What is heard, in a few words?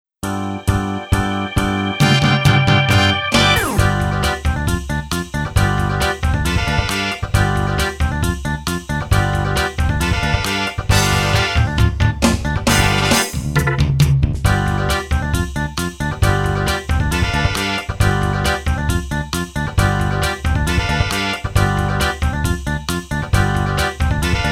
karaoke